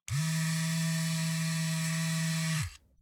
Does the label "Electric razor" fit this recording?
Yes